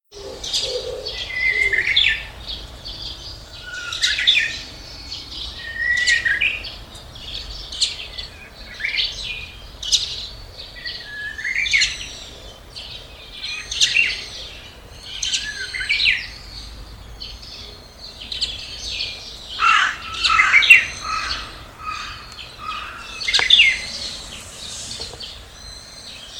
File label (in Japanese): uguisu